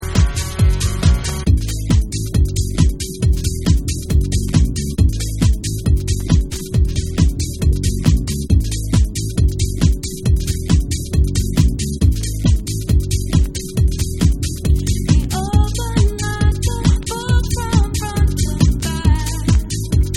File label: Vocal Mix